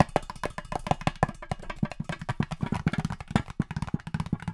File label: LOOP Perc Mono